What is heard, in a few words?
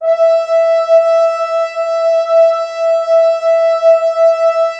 Orchestral Brass